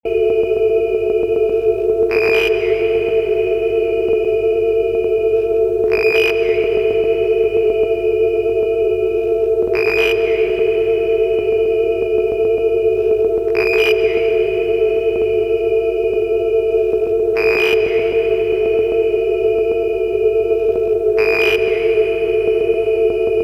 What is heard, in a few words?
alien crickets